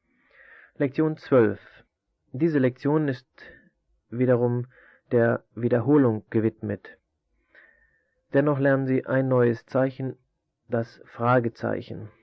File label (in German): Morsen; Onlinelehrgang